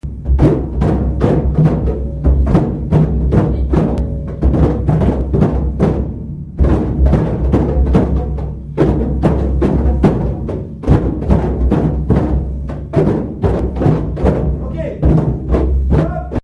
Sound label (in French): tambour; Initiation tambour classe primaire